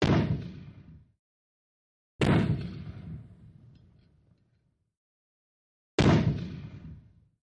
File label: Mortar: 80 Mm Mortar Fires, Medium Distant